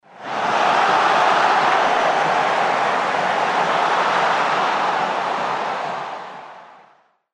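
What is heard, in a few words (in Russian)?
реакция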